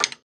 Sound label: wood misc, wood metal; wood metal SFX